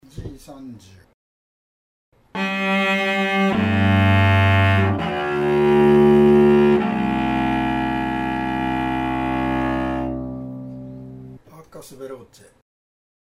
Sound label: arcus veloce, bow mechanics